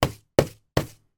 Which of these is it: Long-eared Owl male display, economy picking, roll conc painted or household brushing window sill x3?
household brushing window sill x3